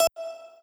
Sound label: lead